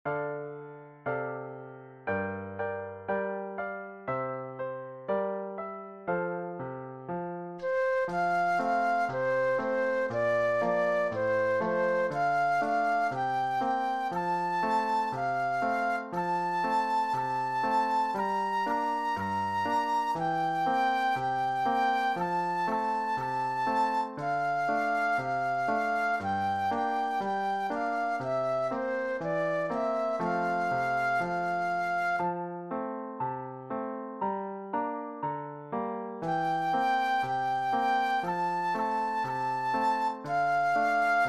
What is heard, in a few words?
Bingo Flute Solo